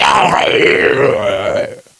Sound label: Gore Death, Ginger